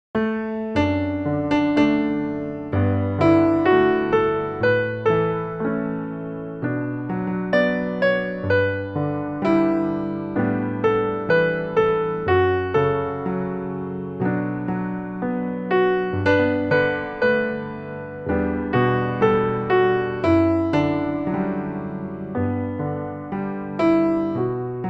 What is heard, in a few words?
shenandoah, piano